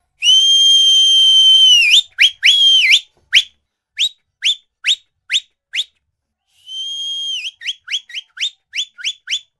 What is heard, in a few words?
whistles of wondering